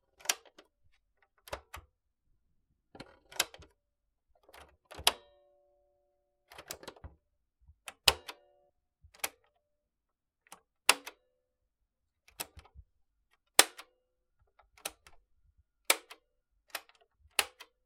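casette player buttons, Sony casette player buttons recorded in a small room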